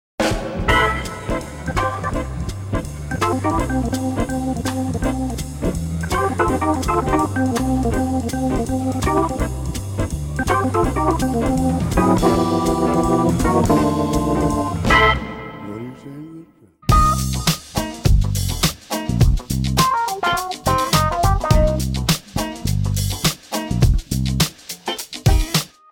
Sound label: Jazzy Groovy Tunes